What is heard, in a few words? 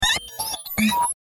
Start-Up Melody Faulty, robot; Crafted Signals